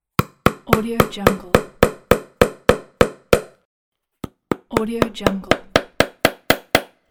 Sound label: fast hammering on nail